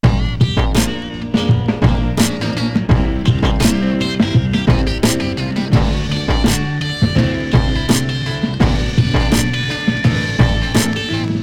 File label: loop